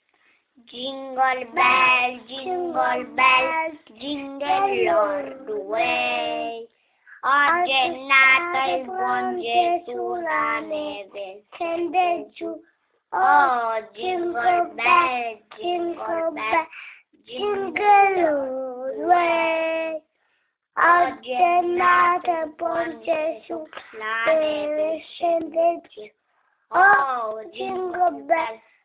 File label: Jingle Bell